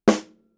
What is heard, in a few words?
Shell Snare single short